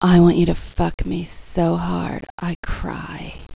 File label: i cry